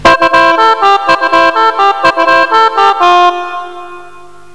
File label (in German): Blasmusik; psion cd vol1